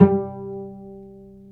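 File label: cellos pizzicato dry; Roland LCDP13 String Sections; STR Vcs Pz.1 dry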